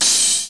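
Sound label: splash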